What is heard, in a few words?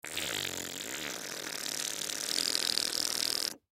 Human Raspberry, Raspberry Male Twelve Yrs Boy; Raspberry Long Male Twelve Yrs Boy; Human Raspberry Long Male Twelve Year Old Boy; Mouth; Sound Effects:Human